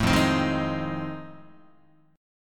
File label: F 7th Sharp 9th Guitar, chord; F7#9 strummed